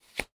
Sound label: card draw; dice, cards, game related; NS Studios - ultra sound collection